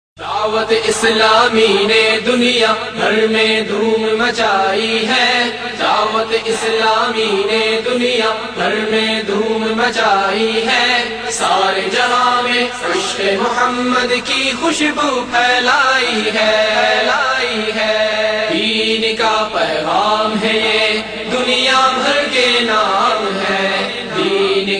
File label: islamic